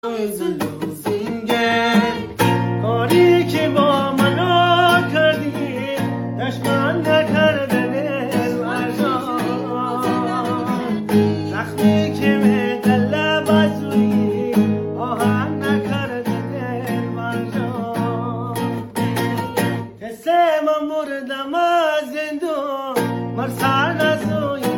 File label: Guitar